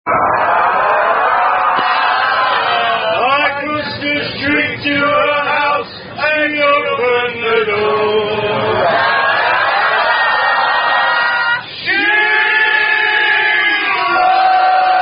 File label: Rugby Players Singing